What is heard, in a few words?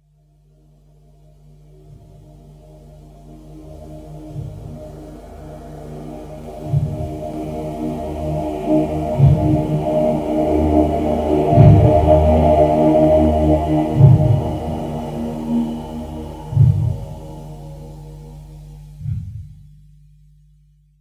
Instrumental, Black Metal